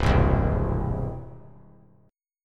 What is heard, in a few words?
C Augmented down; strummed; JGuitar